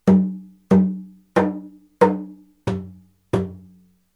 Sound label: drums